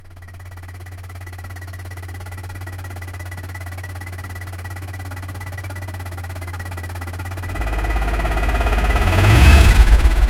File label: ford ltd crown victoria i, automobiles